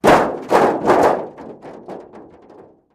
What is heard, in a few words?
Car Hood Drop On Groun